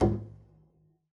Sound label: pizz noise mute d4, double bass